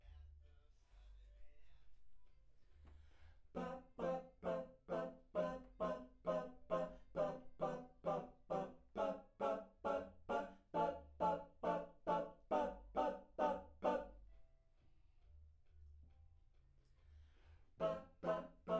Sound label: Backs AKG LEFT